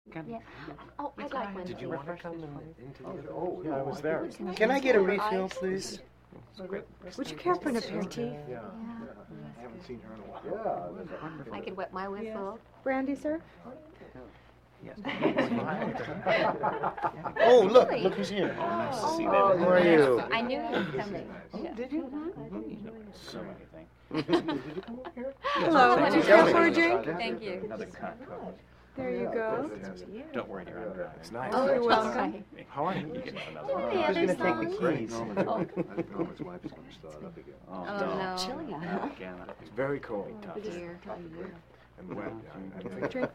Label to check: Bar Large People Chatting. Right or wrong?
Right